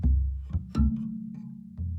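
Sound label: Calm Far, music; From Dust; PS3; Xbox 360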